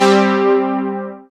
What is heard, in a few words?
SYN DNC, dance; Sound & Vision - Gigapack II CD 1